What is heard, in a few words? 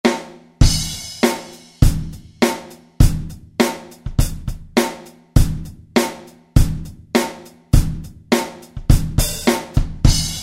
Wide Rock KIT; Roland SRX-03 Studio SRX